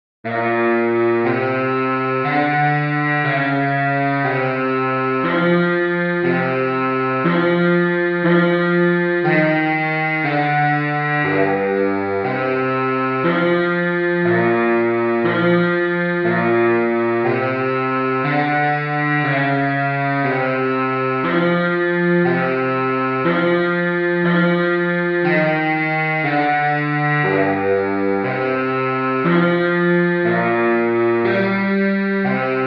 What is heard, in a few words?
bari sax